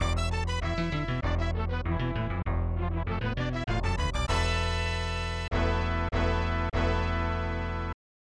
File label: Promotion; Wakataka Oozumou; SNES